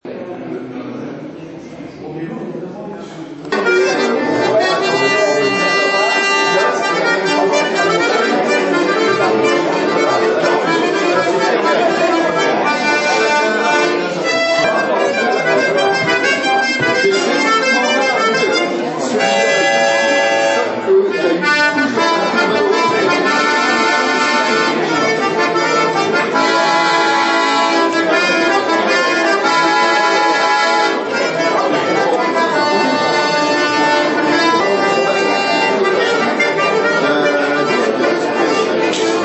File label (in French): accordeon